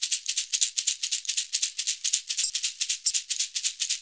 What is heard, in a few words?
SHAKER, Modern Percussion Loops